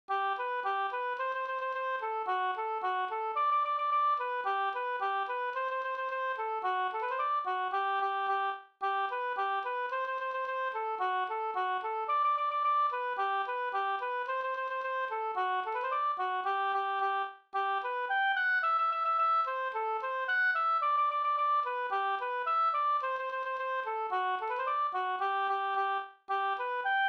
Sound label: polka